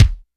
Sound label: BD, SR16 drums; 90 s Sample CDs; Best Service